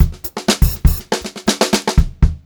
121 FK FILL L, Funk-Groove Drumloops; USB Soundscan vol.38; AKAI; FUNKY

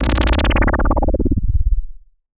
Juno Bubble

